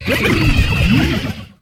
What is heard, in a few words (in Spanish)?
Grito de Toxapex